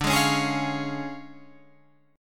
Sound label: D Minor Major 7th Flat 5th, D, Guitar Drop D